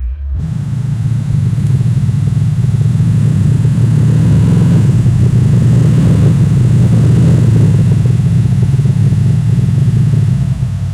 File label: old sport car realistic